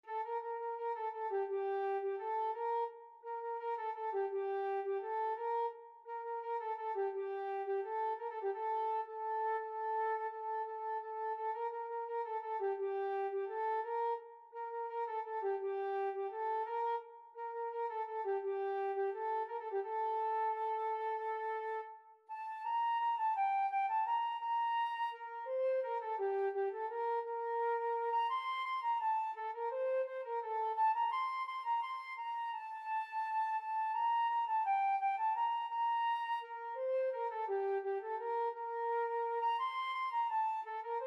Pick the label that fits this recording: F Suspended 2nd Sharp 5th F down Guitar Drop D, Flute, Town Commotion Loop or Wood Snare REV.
Flute